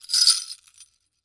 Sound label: bell sleigh bells shakes single